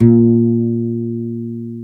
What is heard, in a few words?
Guitarron A#1; Planet Earth; Digital Sound Factory Planet Earth